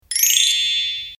chime, ringtones; tsumtsum; Disney

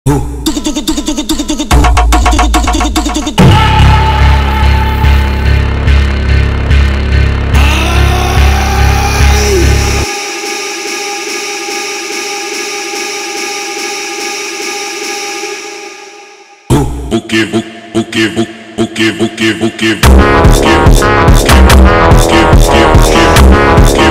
Phonk Sped Up

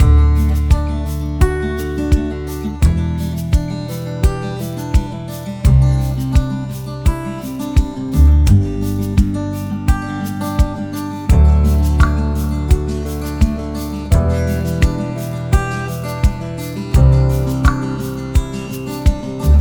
folk acoustic

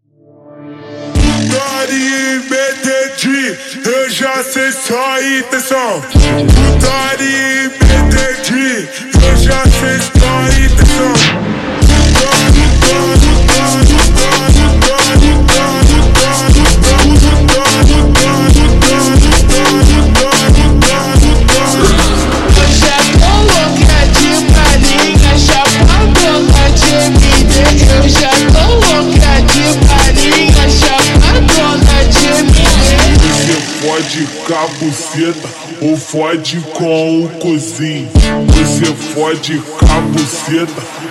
Montagem super Slowed

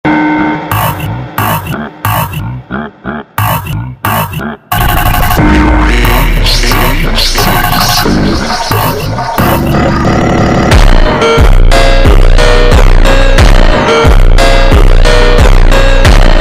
Funk Super Slowed

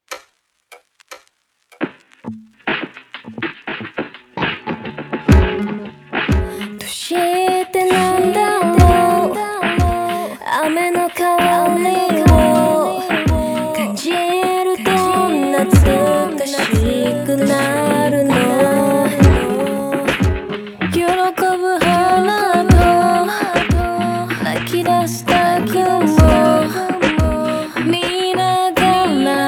Slowed reverb

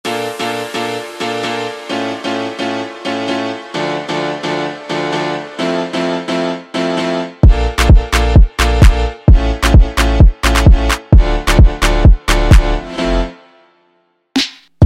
Montagem instrumental

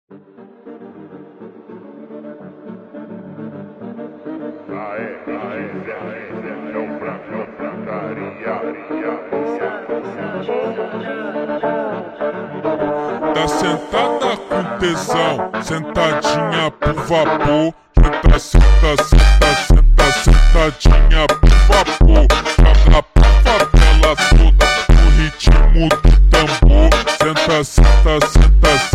FUNK Ultra Slowed

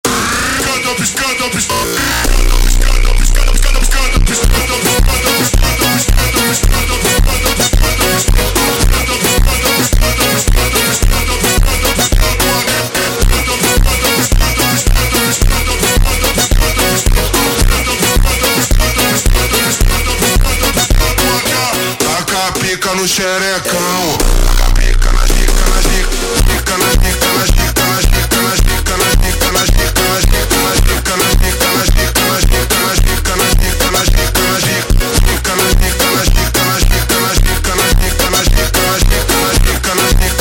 Montagem Super Slowed

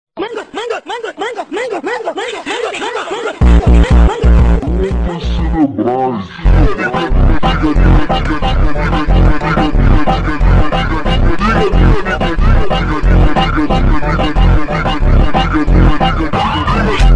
Slowed + Reverb deep flanger echo, funk

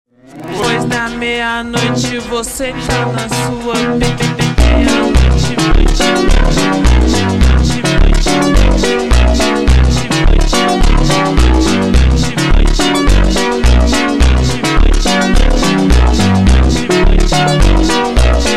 Montagem super Slowed

